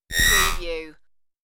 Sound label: alien power charge